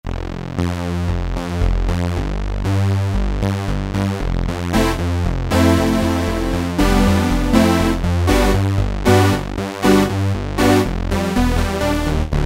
Brass

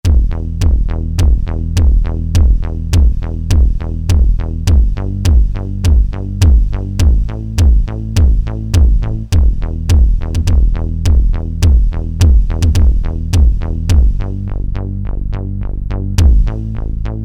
RHYTHMIX, Synth Bass & Drums, Korg DSS-1

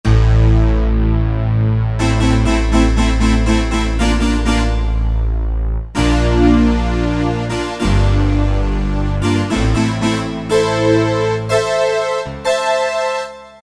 Atmosphere, Roland U-220; Factory Patches

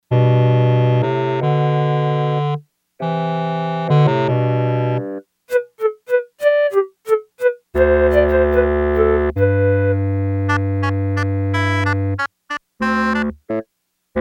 Woodwind Quartet, Yamaha TX802; Performances; Factory Patches

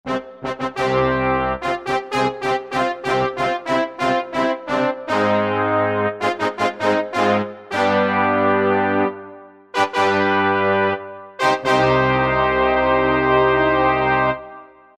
Brass Classic Brass, Yamaha EX5R; Factory presets

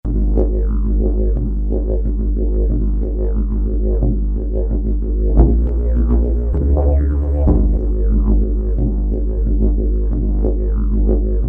3D DIDGERIDO, Roland SC-880; Factory Patches

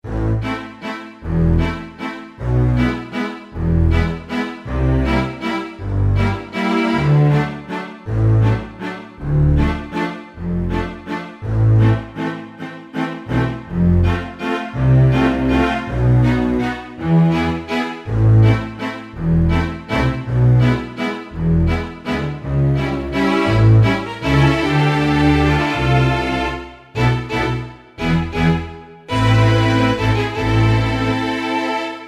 Bass String Sec; Kurzweil K2000 Orchestral ROM

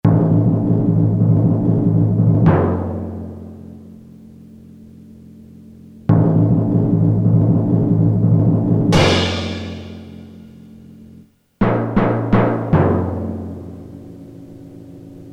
ORCH PERC, Orchestral Perc, Korg DSS-1

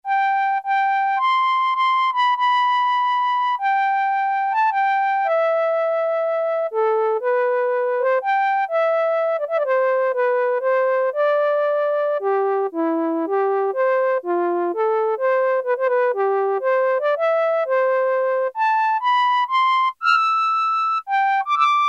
Flute, Roland JX-3P

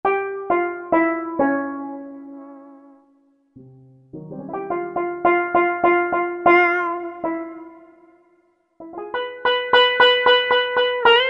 Sitar; Korg MSC-10S Ethnic

